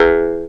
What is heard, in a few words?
E JAW, Primitive Instruments